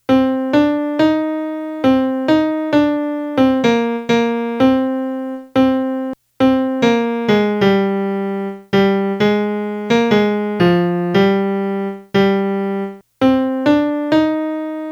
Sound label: melody; southern songs